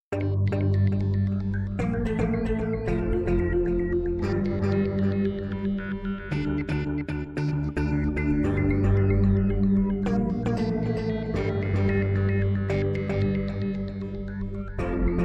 bull pedal plus

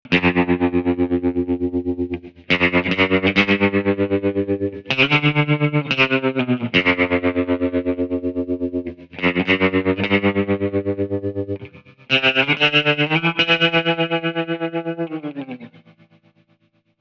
Fender Vibro Fast

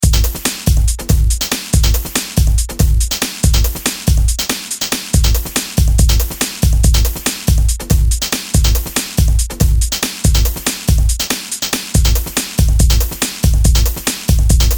synth funk kit

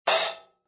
choke

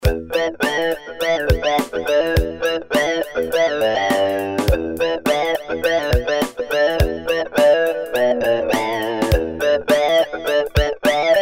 Talk Box

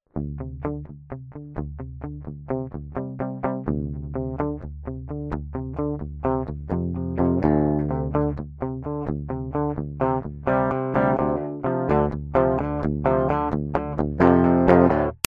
Heavy

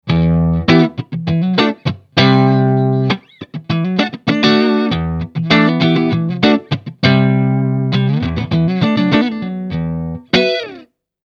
clean

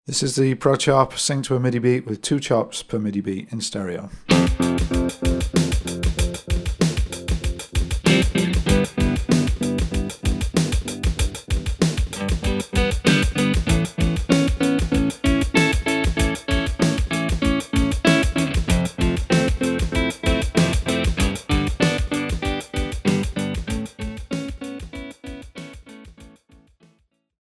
2 Chops per beat

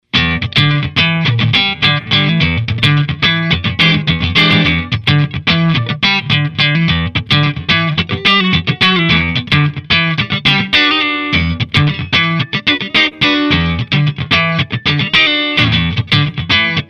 funk riff clean

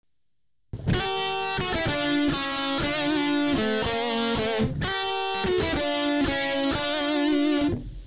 harmony lead

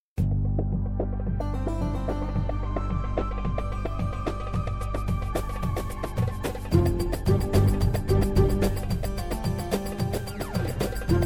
Arabic; Sound Showcase

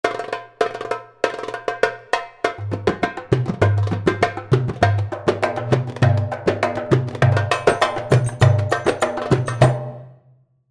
karachi 100, darbuka

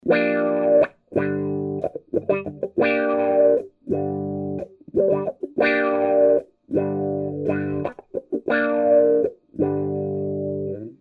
guit

